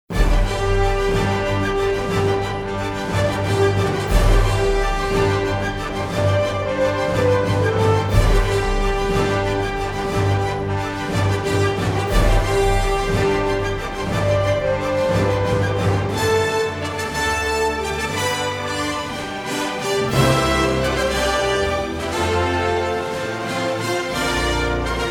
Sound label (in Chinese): 豪迈的背景音乐; 3分以内